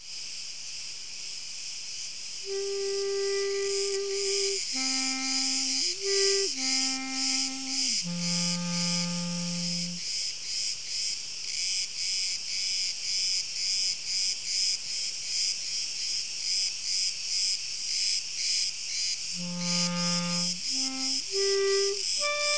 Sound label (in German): Grillen Stand Uebung Hunde